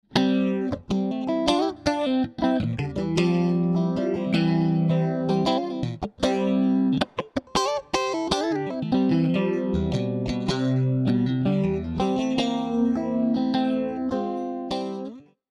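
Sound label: Electric Guitar Phasor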